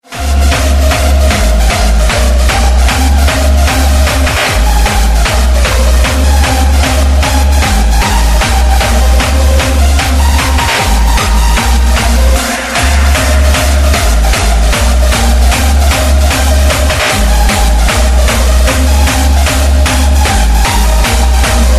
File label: Jumpstyle